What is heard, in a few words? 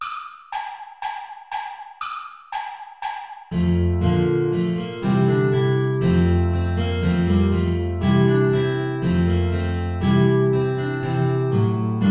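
bourrée play along without guitar; praetorius michael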